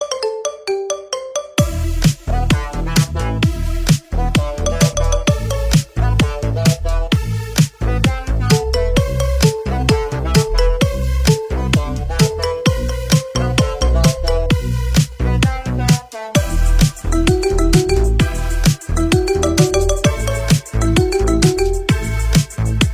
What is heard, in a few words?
Marimba Remix